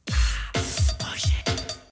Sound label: push